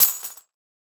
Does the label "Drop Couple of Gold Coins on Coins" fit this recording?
Yes